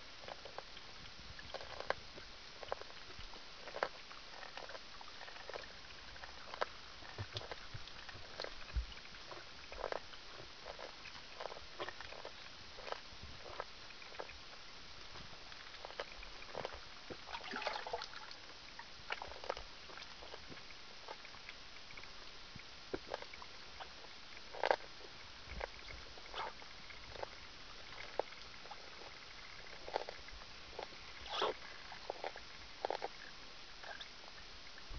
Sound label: feeding, fish; tivoli bay sounds